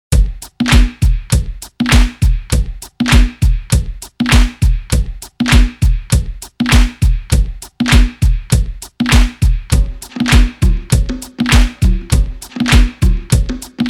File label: bpm 100